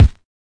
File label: kick